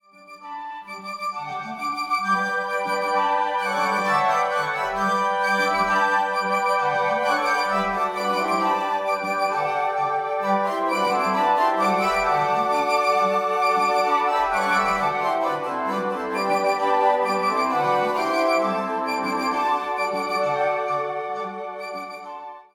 Bolero ensemble de flutes; Ravel

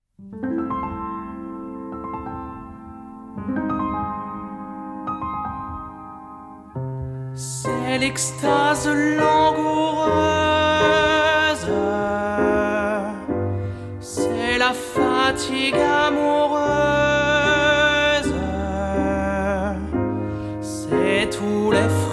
chant piano; Romances sans paroles; Cest lextase langoureuse

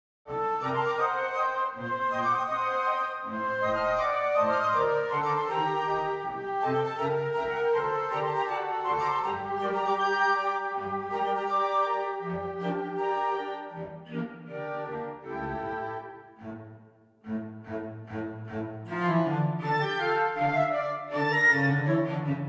Shalom Aleichem Variations flutes violoncelle; Traditionnel

